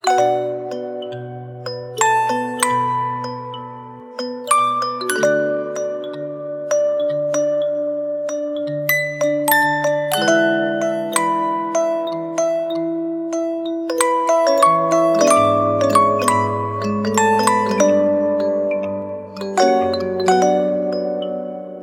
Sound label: lullaby; vitamin connection switch gamerip 2020